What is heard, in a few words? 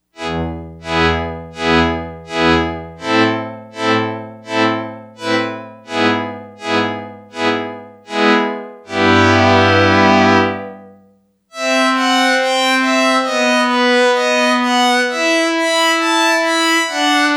Strings, Yamaha DX100; Factory Patches